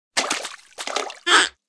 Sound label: duck Fetch Anim; scruffy duck